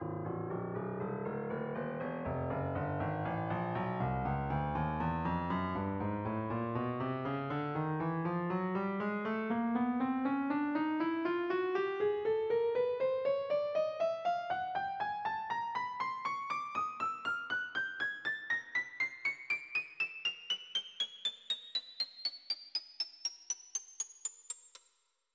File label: test scale